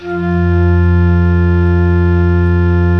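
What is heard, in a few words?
Tone F4, church organ; Best Service; Advanced Media Trax Modern Composer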